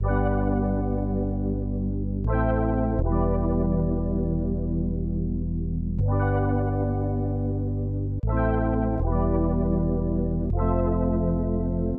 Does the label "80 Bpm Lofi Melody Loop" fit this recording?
Yes